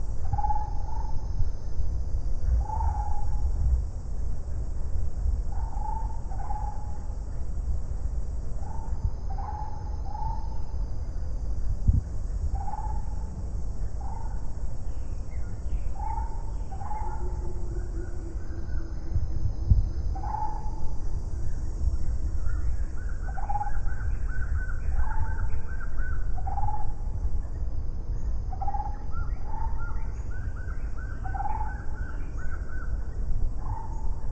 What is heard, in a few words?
jungle loop; casualtiesunknown